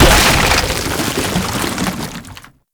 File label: Rotpod Destroy; Warframe; NineteenNinetyNine; Stage10TheZoo